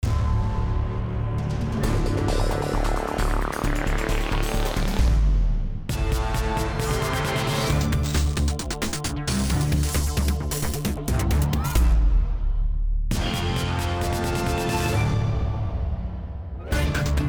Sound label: Battle Loop; bakugan champions of vestroia 2020; switch gamerip